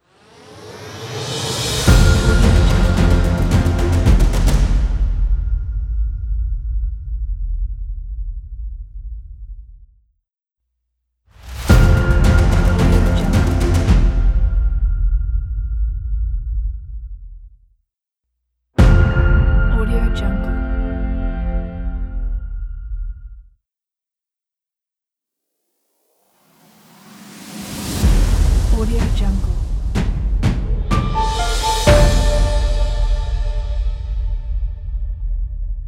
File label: logo cinematic motivational hollywood